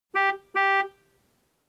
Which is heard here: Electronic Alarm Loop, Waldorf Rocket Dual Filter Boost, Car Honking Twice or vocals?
Car Honking Twice